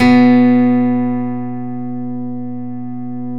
12 STRING; E-MU Producer Series Vol. 2; More Studio Essentials; Composer

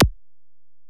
EPerc, Alesis DM-PRO

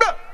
blup

